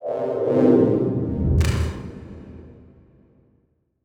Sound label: Title Transition Stab Dark Short; Production Element; AdobeCC